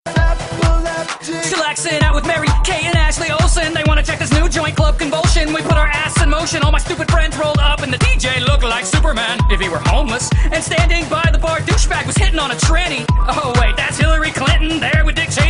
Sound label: Techno